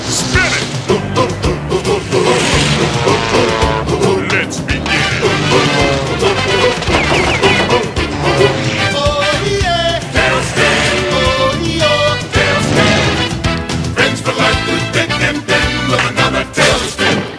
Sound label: tailspin